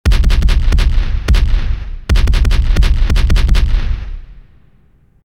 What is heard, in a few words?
Cannon; Halloween